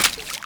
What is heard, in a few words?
Steps Water, Footsteps